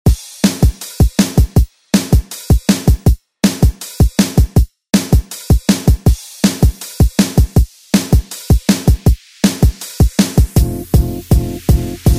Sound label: Re Drum; DJ Mhark